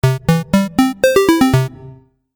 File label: Retro Game Melody; Video Game Sound Effects